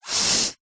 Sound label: human nose blow single nostril hold other